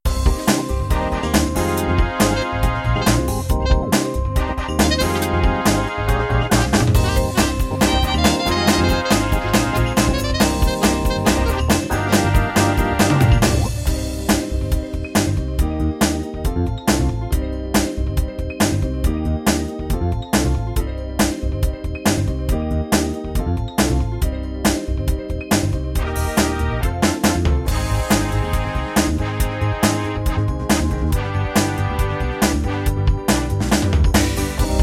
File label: 70s Chart Soul, rhythms; mzx500; preview pack 01